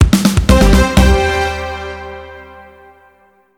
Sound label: jingle; Photon Cube; PS Vita